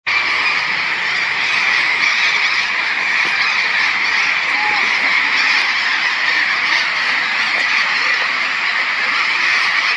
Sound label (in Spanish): Canto de loros, Loro Barranquero (Cyanoliseus patagonus)